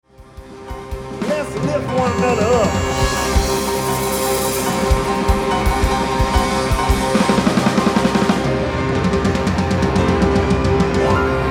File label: temple fireworks stand; mission